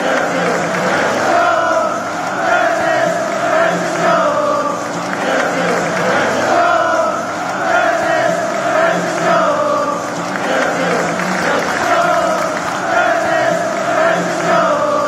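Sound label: liverpool player chant, football chants; curtis jones; england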